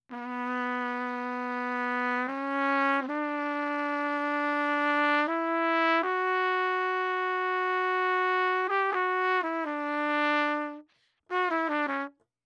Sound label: horn